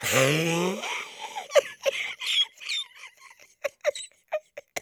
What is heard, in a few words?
Soldier laugh long, de